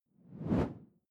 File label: whoosh simple steady low; swooshes-swishes